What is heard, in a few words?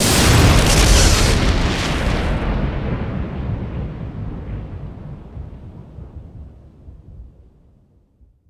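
Explosion Large; SFX Kit; Destruction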